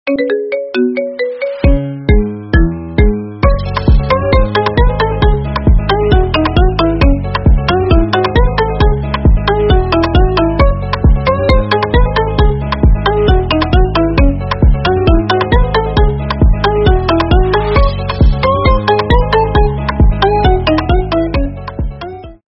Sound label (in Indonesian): nada dering chess type beat